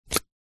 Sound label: household insect repellent roll on plastic lid remove